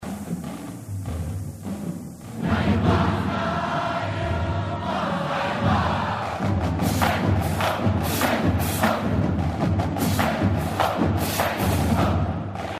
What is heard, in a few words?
cadence